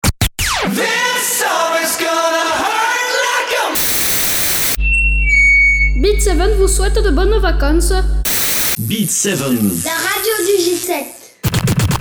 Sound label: Jingle